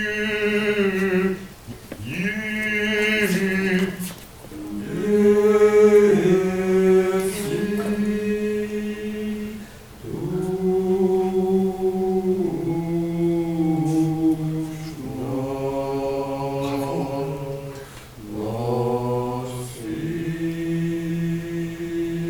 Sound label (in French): Ténors, Chorale; Les Vêpres